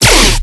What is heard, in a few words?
BASS SHOT Growlolow C#